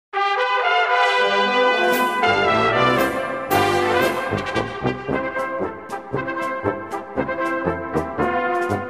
trompeten echo

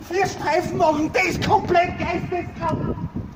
streifenwagen

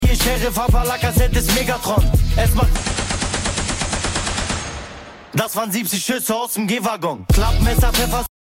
Schüsse aus dem G Wagon

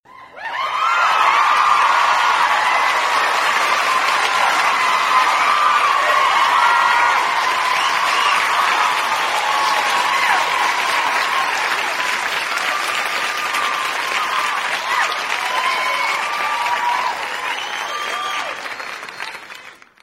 aplaus

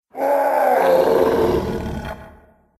ork schrei

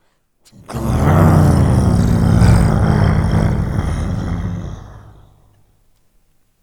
grummeln

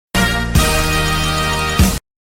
einfach tusch

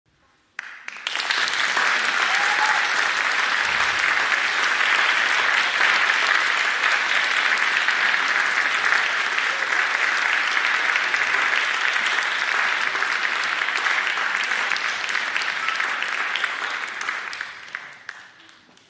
klatschen; tiktok

